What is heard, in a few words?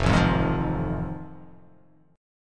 F 7th Sharp 9th